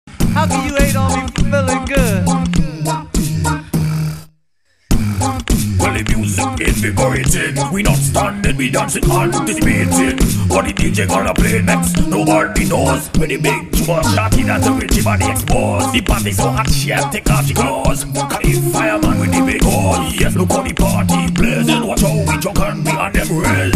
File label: funny vocal